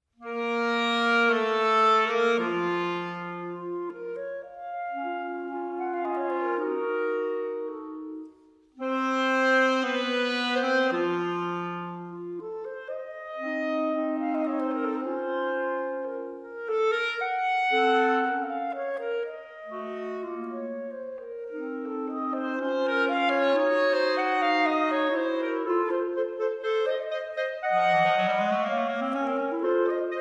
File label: Trio; Hummel